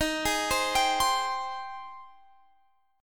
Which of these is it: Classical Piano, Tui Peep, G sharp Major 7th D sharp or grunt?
G sharp Major 7th D sharp